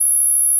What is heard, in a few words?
PeakSlope D2